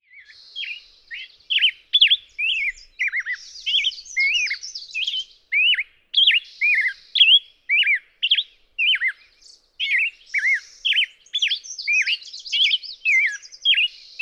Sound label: Black-headed Grosbeak; Dawn singing, example 2. May 28, 2009. Xylem Acres, Ola, Idaho.